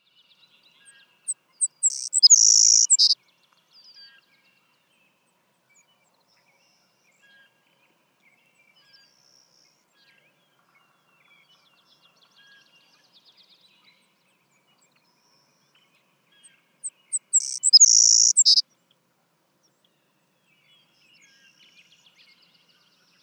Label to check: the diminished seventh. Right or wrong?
Wrong